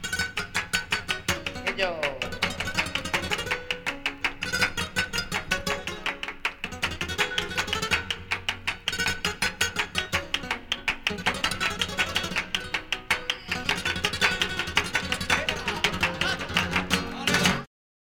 Alegrias, flamenco

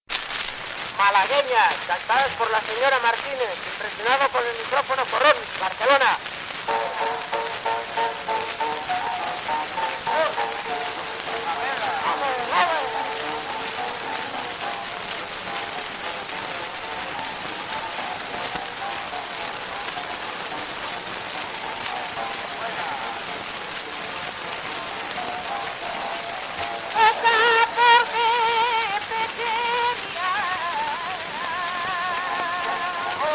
malaguena piano

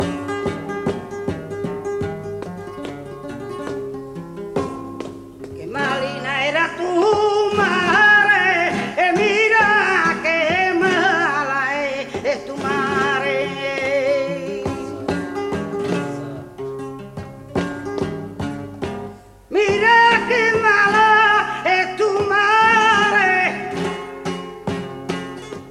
Solea, flamenco

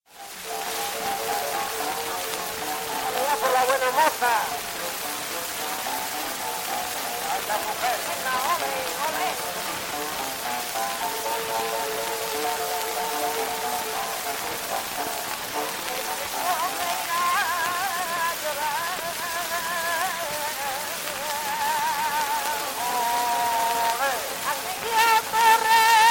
malaguena piano

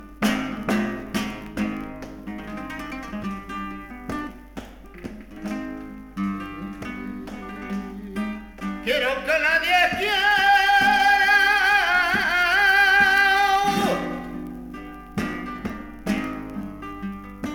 Solea, flamenco; del Quino

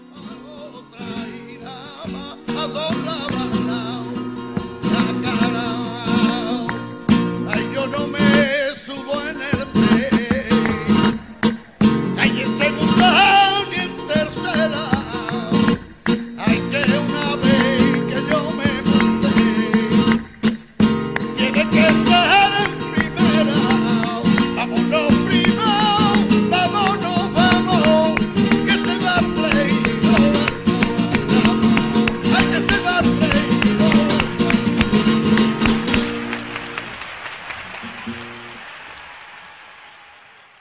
Solea cambio por solea, flamenco; de Antonio Mairena

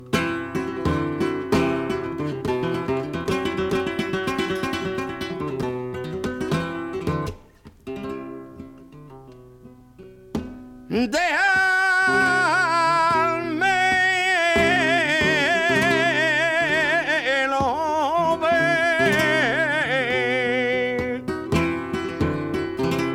Siguiriya, flamenco; de Francisco la Perla

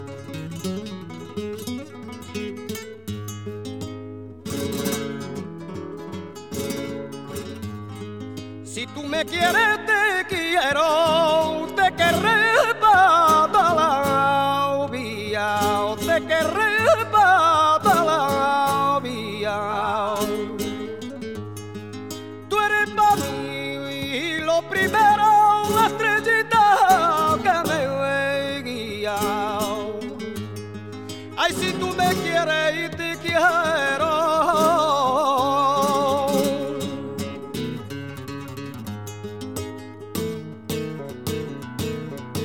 Bamberas por solea

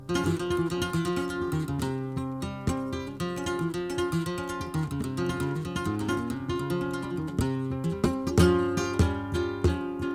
Siguiriya; del Fillo; cabal

